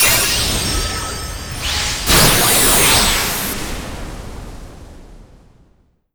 Gacha Tap Projection; disney star smash android ios mobile gamerip 2020

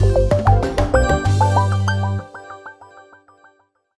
Jingle Finish Gold; Super Rub 'a' Dub; PS3

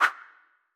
Rotate

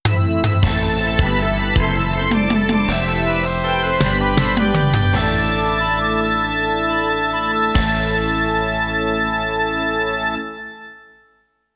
Final Stage Complete [Roland GS]

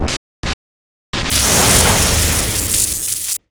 Anniversary Gacha Noise

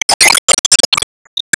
Enemy Lost Attack

